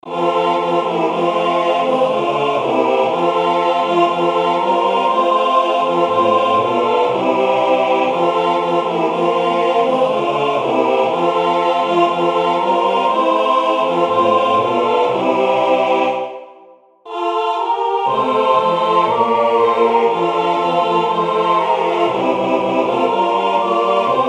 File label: De cap ta l Immortela tutti